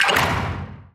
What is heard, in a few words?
arms up; forsaken